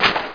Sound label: whip